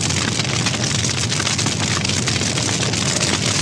soft slider slide; osu; beatmaps

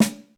drum slider tick

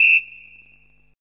normal hit whistle

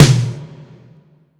taiko normal hit finish

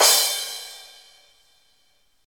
soft hit finish

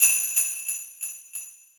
normal hit normal; osu; beatmaps

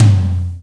soft hitclap; osu; hitsounds; deadcodehs

